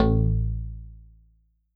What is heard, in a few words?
bass A